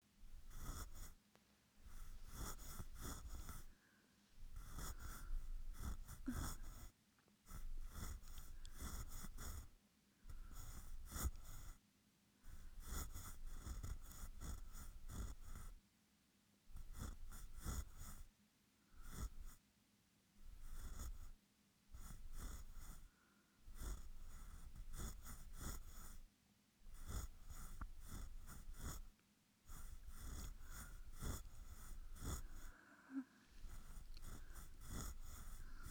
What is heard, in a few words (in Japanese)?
耳かき エンドレス 左